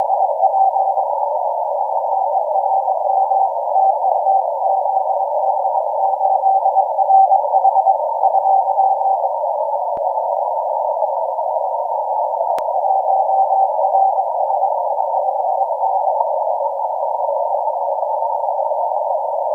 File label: 12m